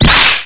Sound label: mouse grab